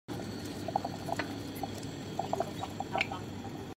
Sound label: Boiled Corn